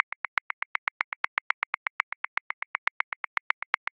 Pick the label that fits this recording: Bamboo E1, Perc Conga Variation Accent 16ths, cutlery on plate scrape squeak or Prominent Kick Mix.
Perc Conga Variation Accent 16ths